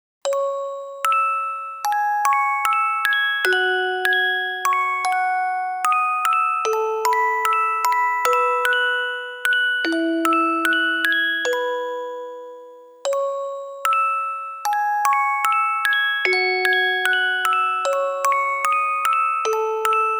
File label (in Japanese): オルゴールver.